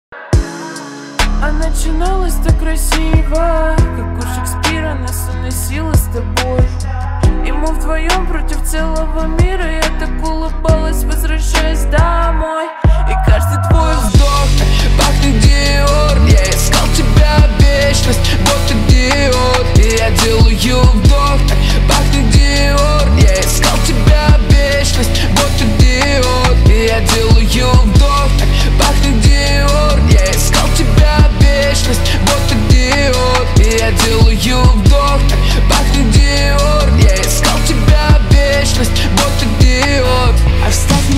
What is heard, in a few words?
slowed reverb